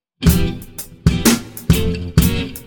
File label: Little Groove SLOW